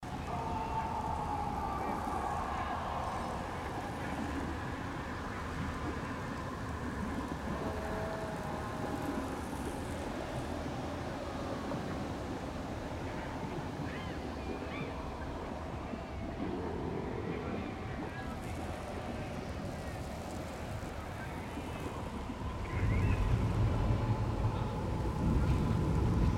avernus, ambience; pf2e; hells destiny

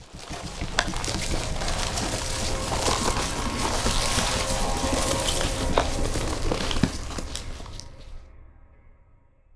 breaking the curse

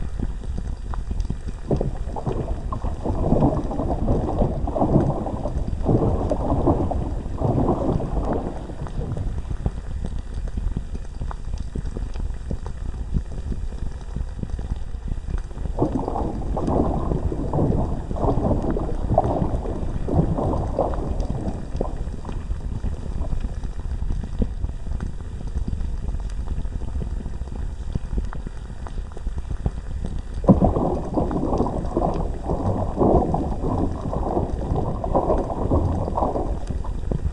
lava pool

